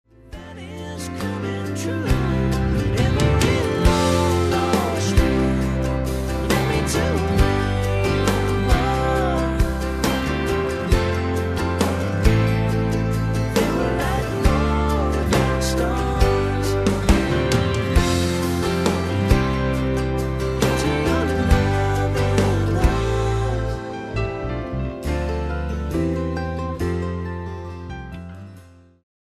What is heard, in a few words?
karaoke; disney